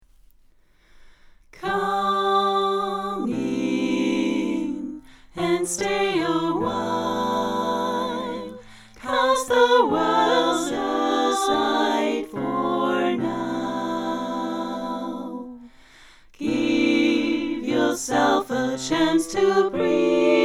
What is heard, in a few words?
alle come in; Singalongs